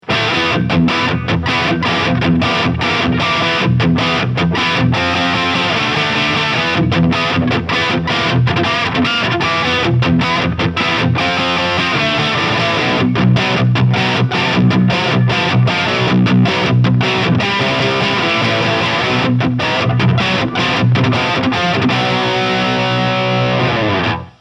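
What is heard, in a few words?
Schecter SLS Elite C 7 Lead Vintage, guitar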